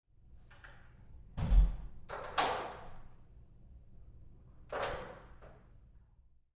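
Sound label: Door Bathroom Stall Close Roomy Light with Lock; Big Room Sound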